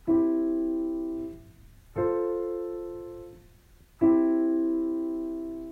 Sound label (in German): I IV I plagaler Schluss; Kadenz: Unter einer Kadenz versteht man die Abfolge von mindestens zwei Akkorden.